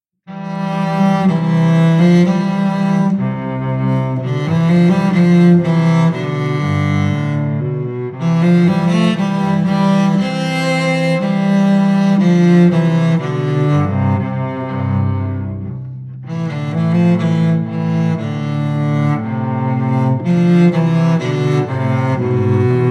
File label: no bass bar, violins